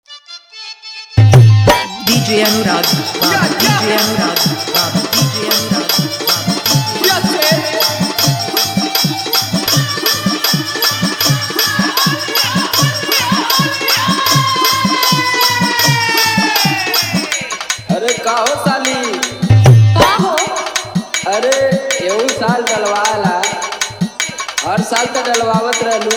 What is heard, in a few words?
Holi Bhojpuri Song